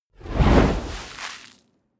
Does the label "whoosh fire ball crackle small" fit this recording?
Yes